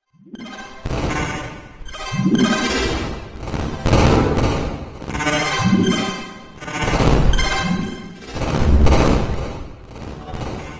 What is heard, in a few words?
loop